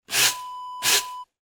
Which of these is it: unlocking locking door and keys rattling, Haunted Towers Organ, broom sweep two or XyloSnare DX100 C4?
broom sweep two